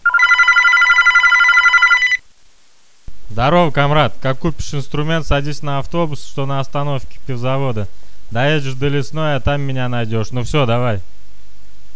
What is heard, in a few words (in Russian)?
zvonok